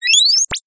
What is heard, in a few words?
science fiction robot power off high pitched